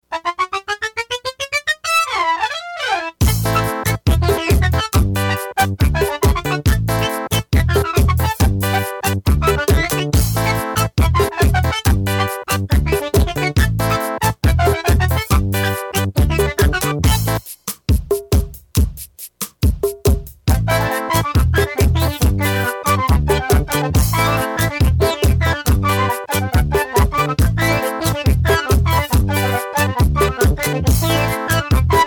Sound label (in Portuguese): ritmos pop 100